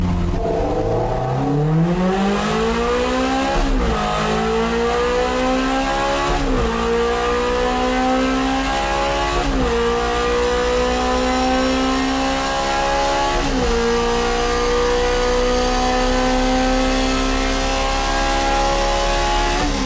Ferrari 360 Modena

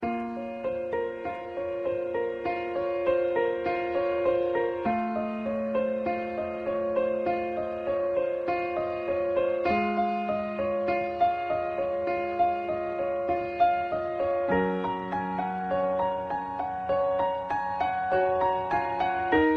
The Beautiful Notes

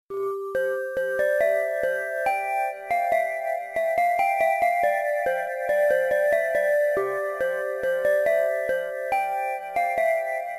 Bells Melody

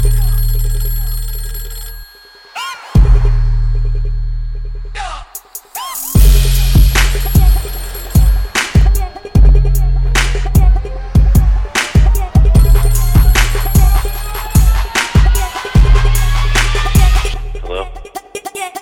Trap

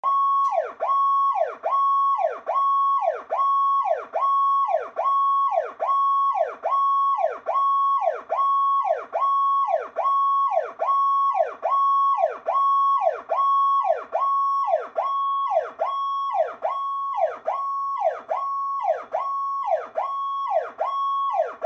siren; Powercall

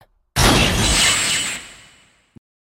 Squip Noise

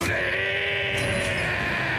WRYYYY

